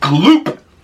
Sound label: gloop